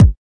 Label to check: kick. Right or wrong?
Right